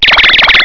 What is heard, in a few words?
CHIRP